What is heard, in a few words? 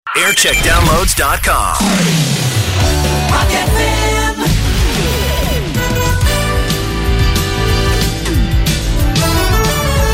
Jingle Montage; Rock FM; JAM